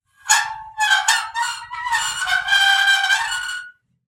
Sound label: Just Metal Squeaks and Moans; soundbits